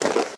armor light; smite; chiron

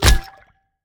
hit goad; smite; god ganesha skin11

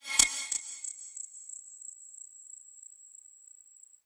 robotics rocket turret sonar ping; smite; vulcan

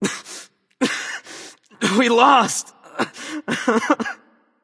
boo hoo; smite; arthur

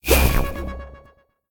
whoosh; smite; raijin

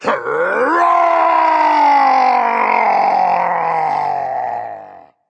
battlecry berserk; cuchulainn

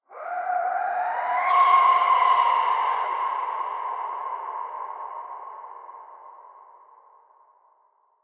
ambience bad death whistle; smite; conquest

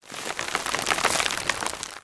stone break lead in; smite; medusa

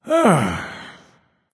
big sigh; chronos

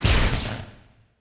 lightning strike; chaacolate; smite

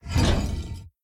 armor heavy; smite; god atlas

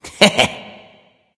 hehheh; smite; kukulkan

